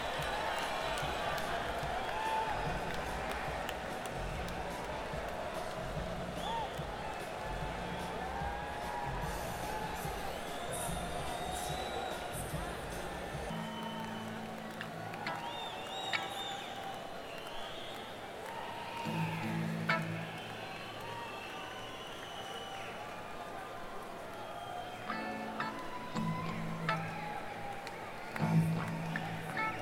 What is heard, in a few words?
Crowd tuning; schoeps cmc4 mk41